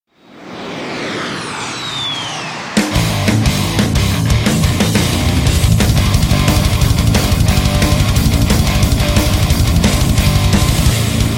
triamp mkiii

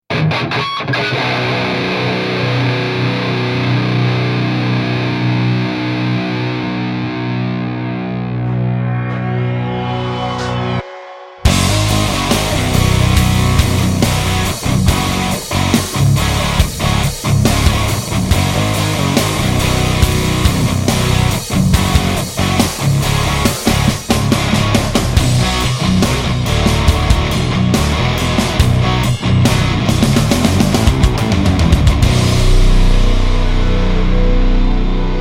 triamp mkiii

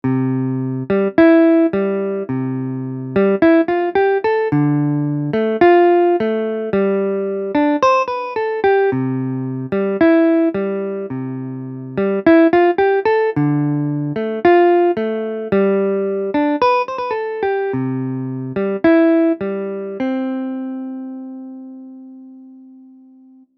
les paul neck mic

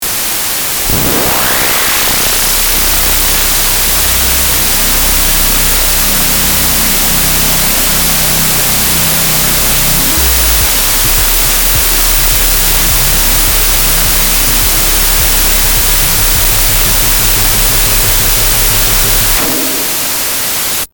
craftsynth 2

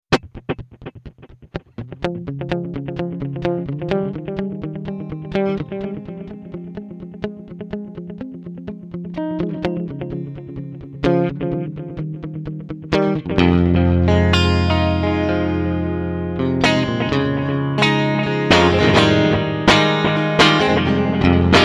clean st dly

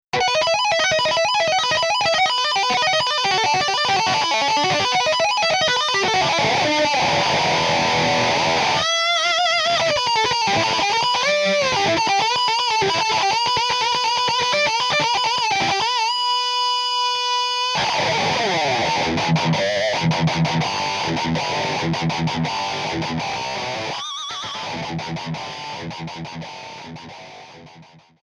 wah wah

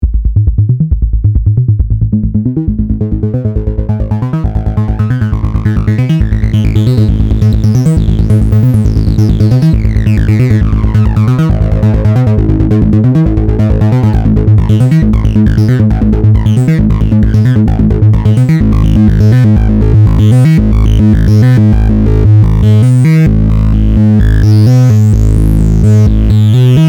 monologue

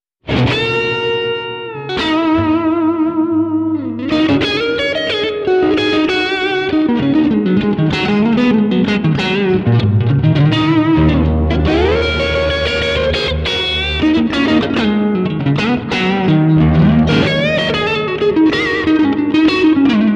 clean mode st rev

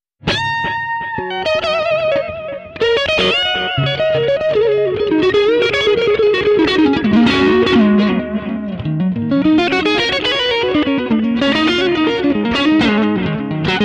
clean mode st dly

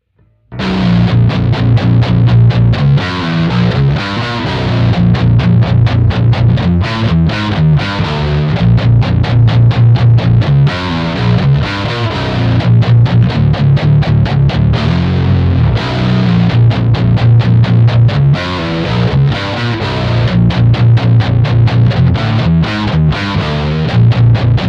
lead

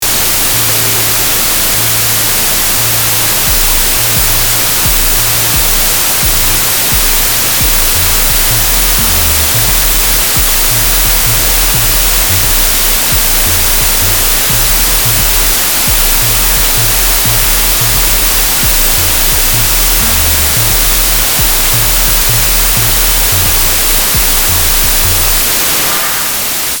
craftsynth 2

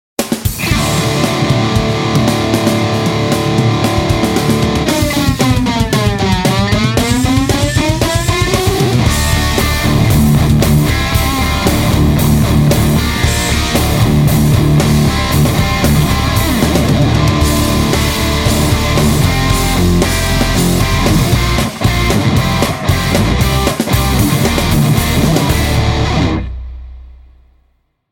triamp mkiii

